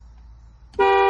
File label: Jaguar Car Horn